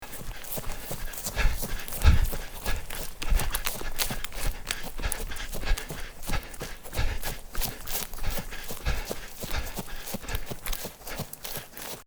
HOMME SOUFFLE COURSE A PIED

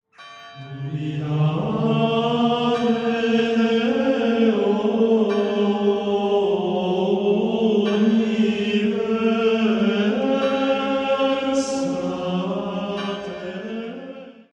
CHANTS GREGORIENS

